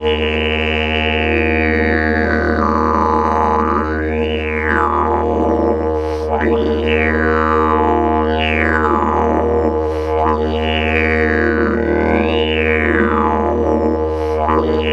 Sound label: LONG DRONE, Didgeridoo; Big Fish Audio - Didgeridoo and Other Primitive Instruments